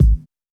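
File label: wet kick sound A key, kick tuned to the A note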